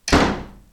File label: Door slam